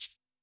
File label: SHAKE, Yamaha SY85 Drums